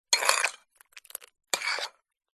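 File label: food cereal in bowl with milk scoop spoon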